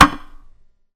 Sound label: structure build